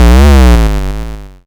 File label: FX Woop; SFX; YM; CHIPSHOP DELUXE; CHIPSHOP HITS